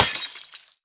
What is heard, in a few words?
break glass tumbler; physics; breaking